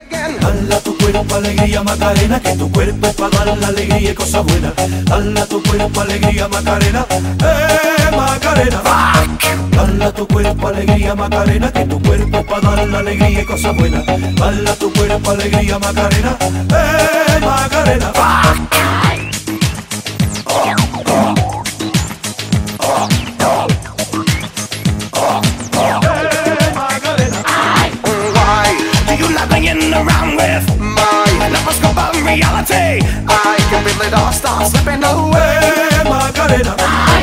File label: emote macaroon music; left4dead2; kodua; fortnite emotes